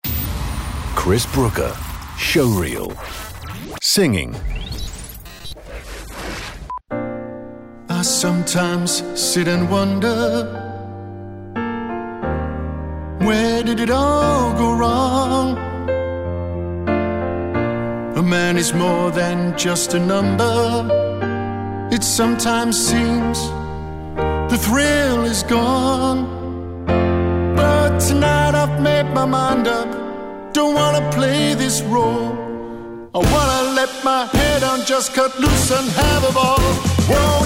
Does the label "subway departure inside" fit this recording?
No